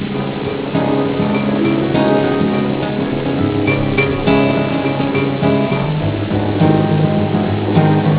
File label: musical